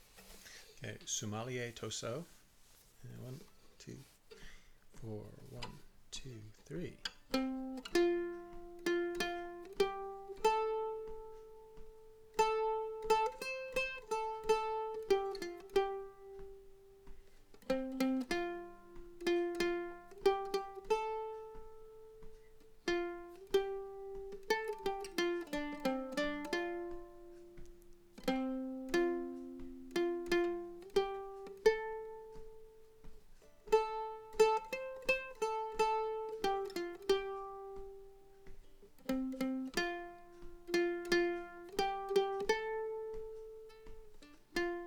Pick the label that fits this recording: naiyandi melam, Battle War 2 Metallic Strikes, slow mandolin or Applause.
slow mandolin